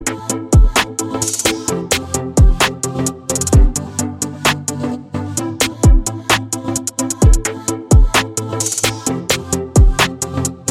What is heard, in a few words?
Digital rhythm; MIUI12